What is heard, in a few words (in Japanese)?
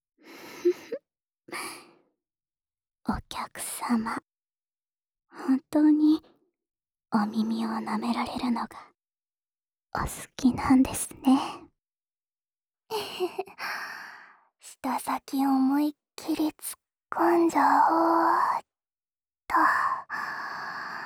耳舐め 二人 逆, ASMR